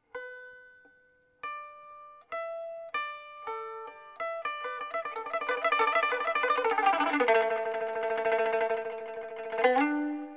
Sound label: liuqin